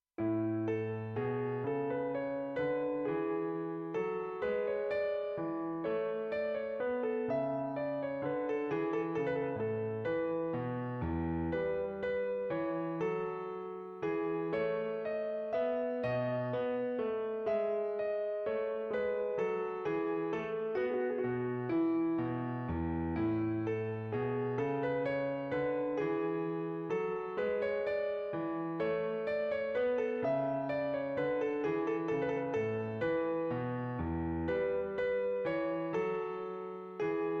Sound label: menuet; haendel georg friedrich